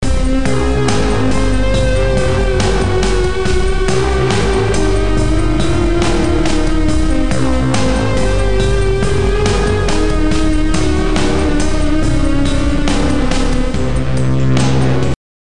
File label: Menus Race; Aces of the Galaxy; XBLA; Windows; Xbox 360